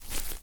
Footstep grass; this is the zodiac speaking 2020 gamerip switch ps4 xbox one pc macos

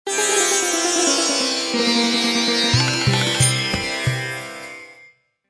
India Music; Civilization Revolution; Xbox

